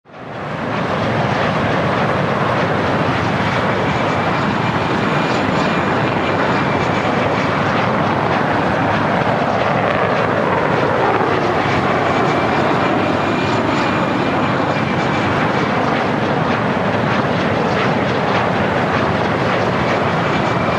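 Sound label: F15 Jet Exterior Close; eagle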